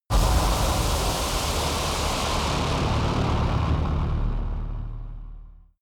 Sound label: shuttle air leak